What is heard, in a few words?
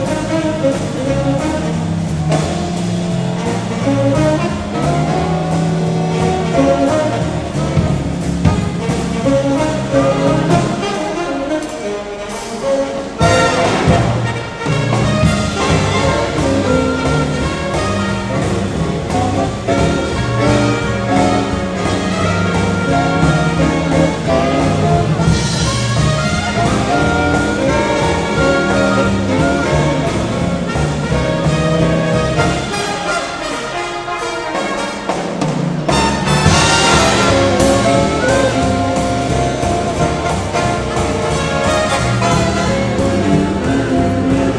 jazz at waterloo; for children in need